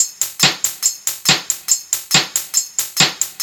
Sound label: NRG Percussion, Trance, Drums; Sonic Foundry (Sony Creative Software); Trance NRG VOL-1